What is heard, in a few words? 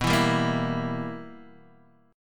B Major 7th Suspended 4th Sharp 5th Guitar DADGAD, chord